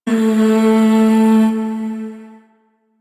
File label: Shawzin Oct One A; Warframe; Zariman